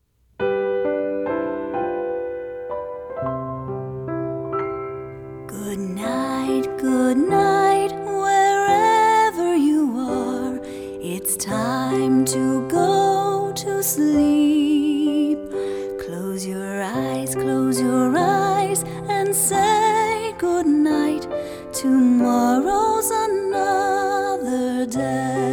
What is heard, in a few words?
Piano; English